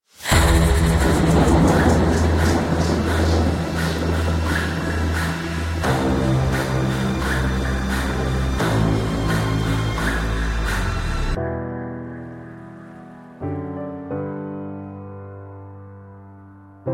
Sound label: Karaoke Version